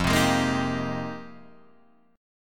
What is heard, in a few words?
F Minor 11th, Guitar DADGAD